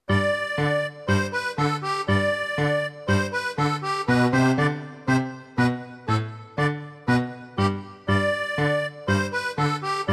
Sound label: Musette